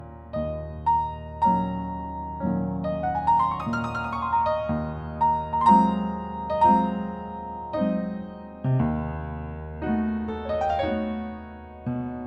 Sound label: Piano Calm; Layers of Fear Inheritance